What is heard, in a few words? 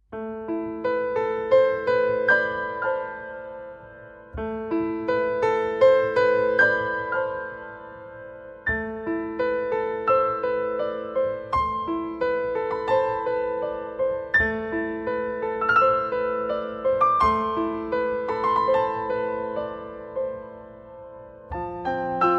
Piano